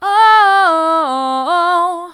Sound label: ooh mid, vocal